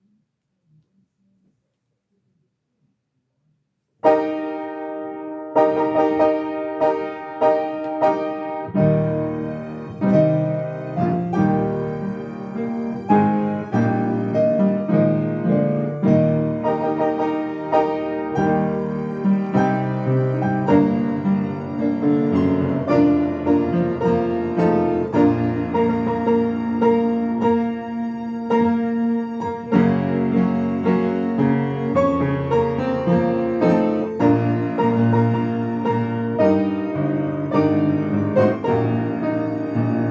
God of our Fathers America the Beautiful Piano Orch